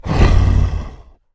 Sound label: growl; smite; god sobek